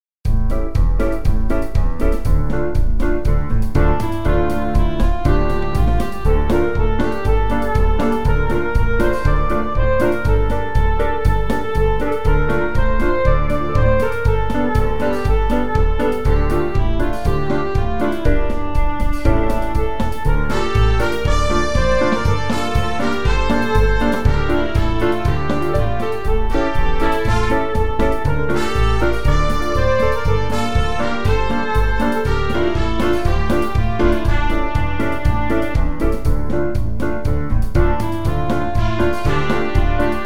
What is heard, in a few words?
instrumental; New Hope Music